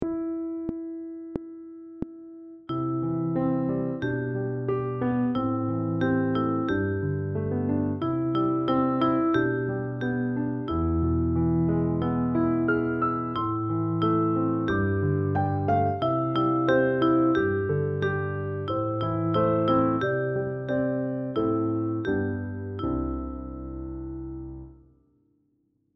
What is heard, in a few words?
Piano Vocaline